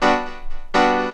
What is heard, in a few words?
P. CHORDS, KEYS